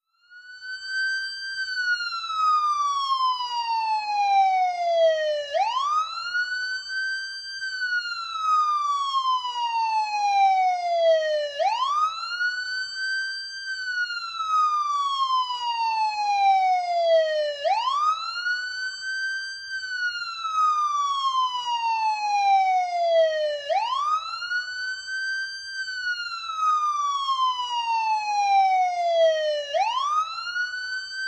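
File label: Ambulance Wailer Siren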